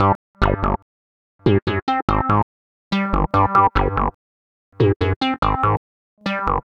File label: Crunch Syn, Keyboards